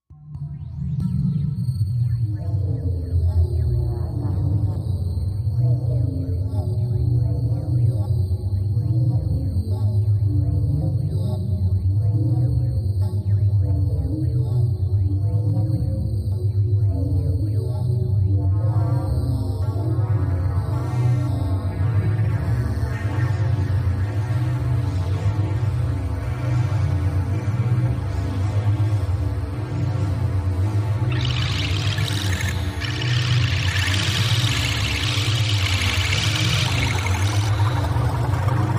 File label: Going Nowhere Slow Rhythmic Bell Tones Speed Up To Metallic Mixer; underwater